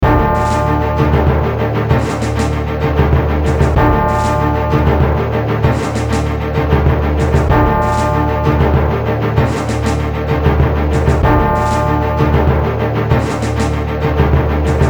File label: Pre-Launch, pinball music; Medieval Madness